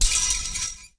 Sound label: fence